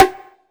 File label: High Bongo Hard Slap; Groove Elements